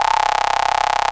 RAVE BEEP; Best Service Alpha Dance vol.2

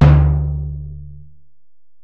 Bell, Alesis DM-PRO

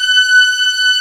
ORCH F#5; AKAI S6000 CD-ROM - Volume 1; ORCHESTRA

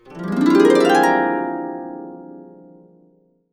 Harps; Complete Harps; USB Soundscan vol.62; AKAI

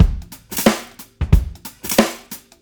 FLAKE, CLEAN KIT; Tuna Beats

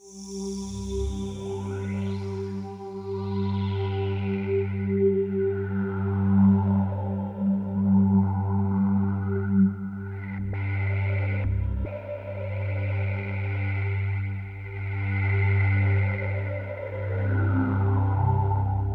Roland JV 2080, poly saw

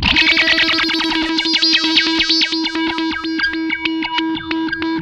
ZAP FX, Funk Guitar; 90 s Sample CDs; Zero G

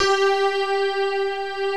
ANALOG STRING; OMI - Universe of Sounds

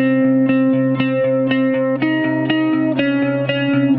Cigar Flange 120 C, guitar drone; 120bpm

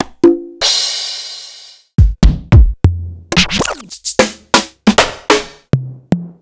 Roland TR-1 Prototype

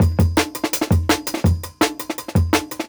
Jungle Breaks

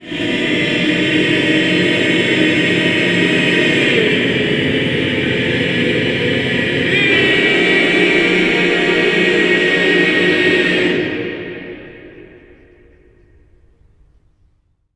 clusters, voices

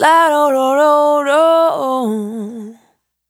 Dry, Single Voice Melodic Loops; Anna-Scopic; Singles

